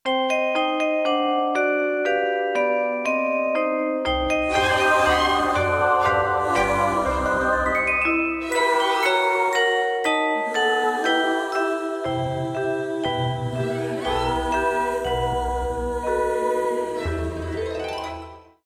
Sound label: Tune; Kameo